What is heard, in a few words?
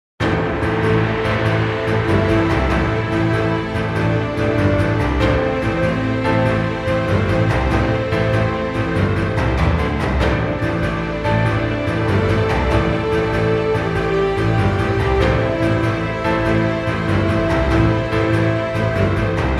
Trailer